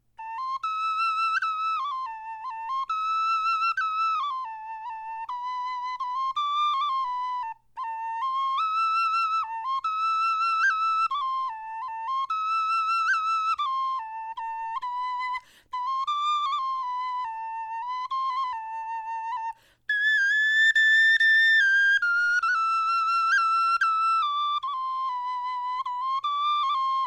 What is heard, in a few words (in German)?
melodie, knochenfloeten